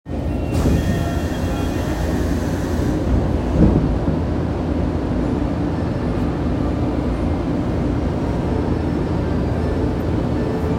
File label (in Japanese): 東西線南行徳東, train sounds